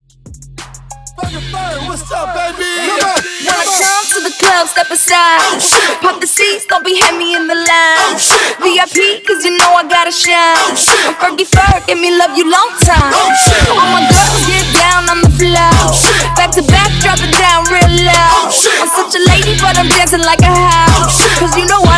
Mashup Blend Hype In Outro; 92 BPM

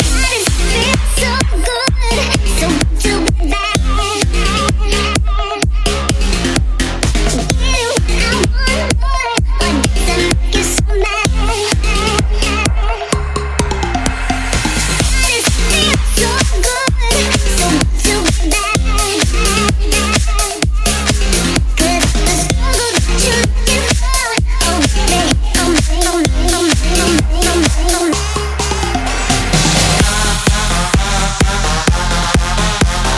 128bpm Fm

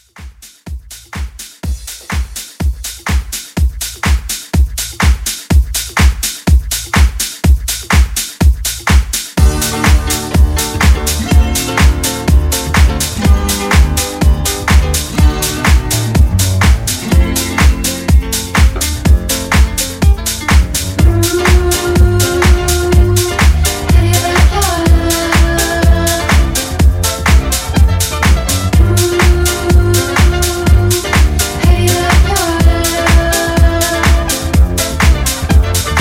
70s Dance House Redrum 124 CLEAN

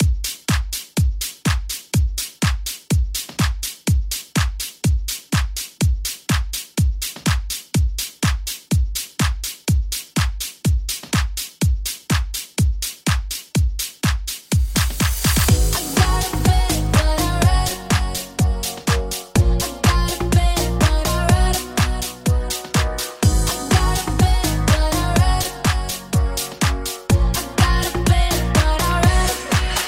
Vocal Redrum 124

